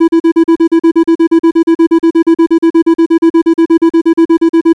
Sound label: PLAT SWAP